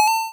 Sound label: coin; spacegame